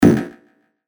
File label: A#, percussion; Brady; revealed percussion vol 2